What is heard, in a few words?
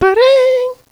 Ring; Bad Vocals